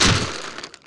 death

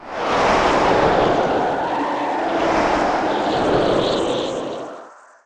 demons

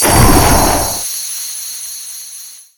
salt

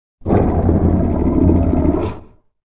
dog

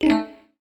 warning

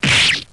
sword

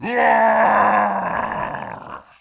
die man

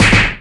punch

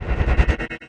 haste

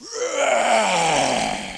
man

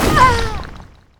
die girl

